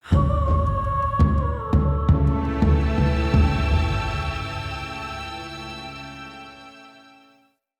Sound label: Win Game; Avakot; Minigames; Ur